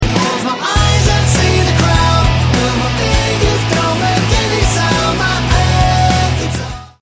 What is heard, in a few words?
airguitar